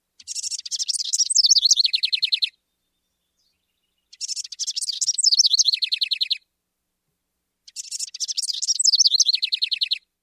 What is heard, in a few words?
House Wren